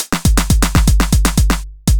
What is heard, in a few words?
groove 120bpm; GroovePizza